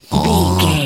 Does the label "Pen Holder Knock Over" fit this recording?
No